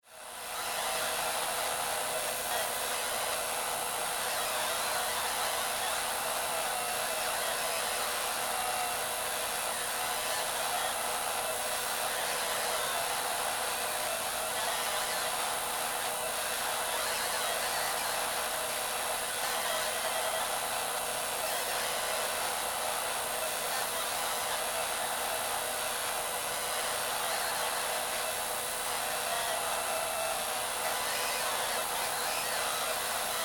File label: Science Fiction Spaceship Cockpit Damaged Communications After Crash; Spacecraft